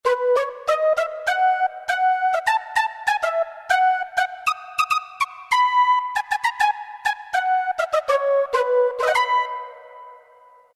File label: Breathpipe; Roland MT-32